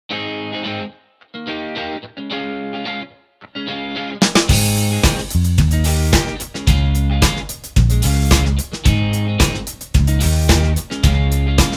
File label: Instrumental